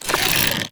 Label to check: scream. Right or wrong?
Wrong